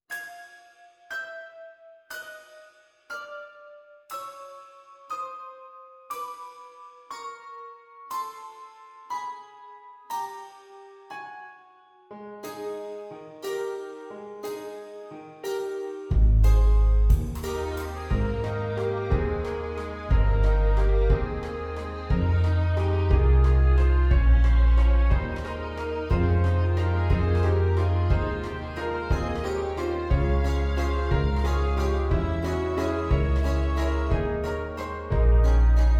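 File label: Waltz instrumental; Twelve Dancing Princesses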